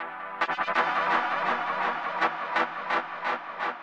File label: SYNTHPAD HOUSE 125 A; eJay; Sphere